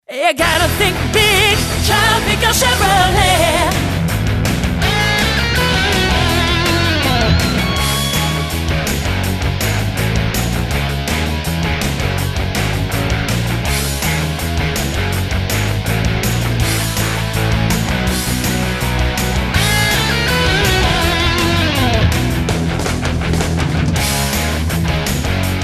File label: Tropical English Radio; Chevrolet